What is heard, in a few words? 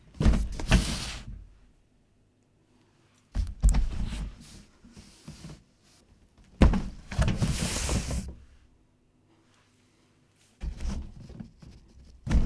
Cardboard Box Table